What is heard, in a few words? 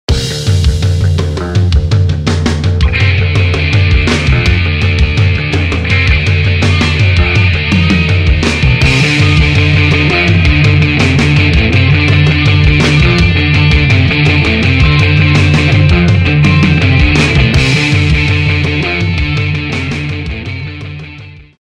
ACID ROCK GROOVE; Suite Coll. Vol.16